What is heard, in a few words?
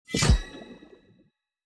symbol remove land; netent